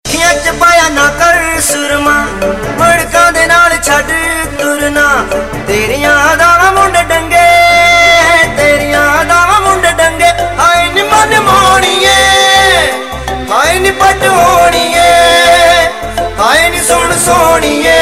Dhol; Punjabi Remix Tones

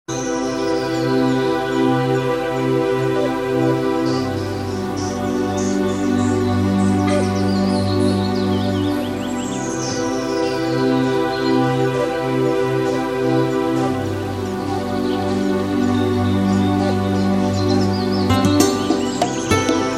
Ambient Chillout Ringtones

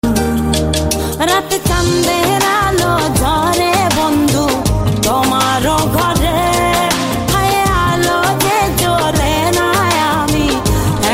bengali ringtone

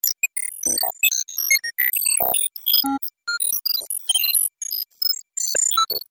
Cute Robot; funny ringtones

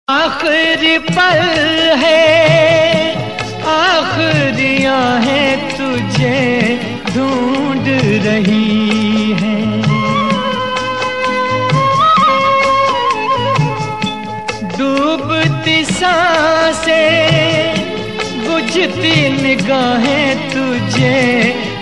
Hindi old Sad Ringtones; Hindi Movie Ringtones

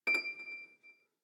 End note, notifications; Android P

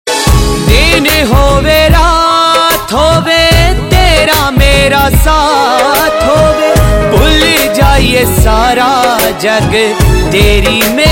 Punjabi Sad Tones

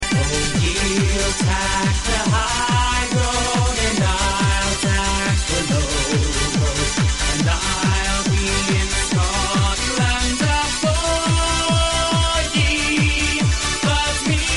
club ringtones

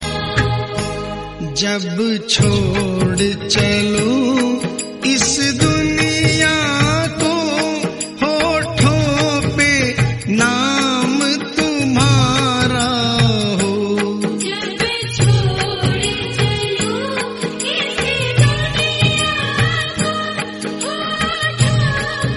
Devotional Ringtones; Khatu Shyam Ji; Jai Shri Krishna

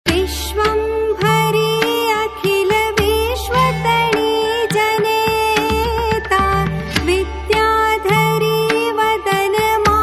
Stuti Ringtone, Devotional; Vishwambhari Stuti; Navratri Special Durga Mata Ringtones

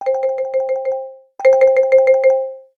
Spokes, alarms; Android P

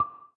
Video Record, ui; Google Pixel 3 Ringtones

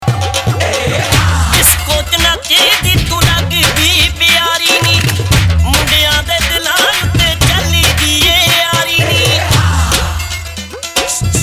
Punjabi Remix Tones; Punjabi Remix Ringtones

